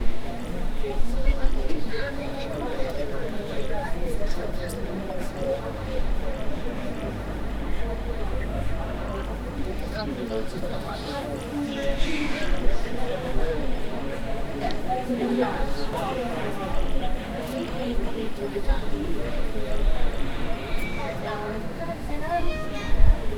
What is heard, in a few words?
the sounds of an evening, coastal